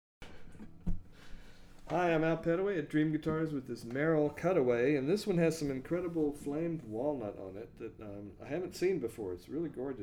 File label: merrill woodbridge, steel-string flattop guitar